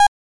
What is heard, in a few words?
notif warning